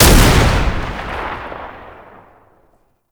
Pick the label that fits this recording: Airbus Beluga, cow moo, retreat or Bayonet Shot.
Bayonet Shot